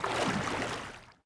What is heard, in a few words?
water wade